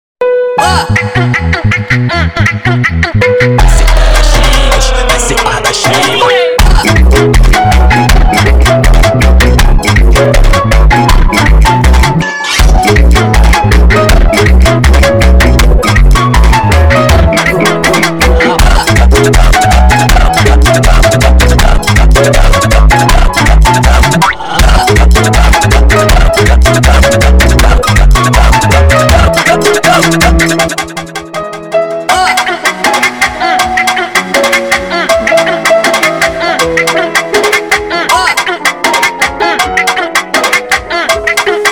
Funk sped Up

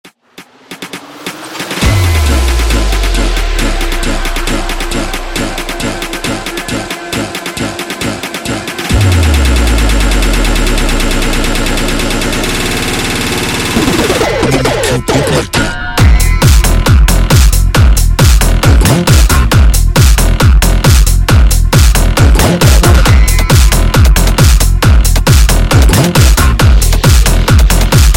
Pump Tekk - Sped Up

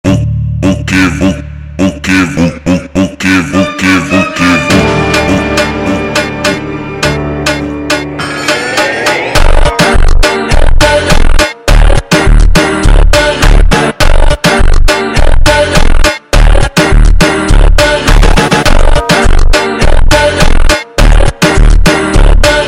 Funk super Slowed

